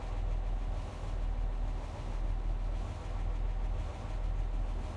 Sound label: valentine engine int rpm; cannons; War Thunder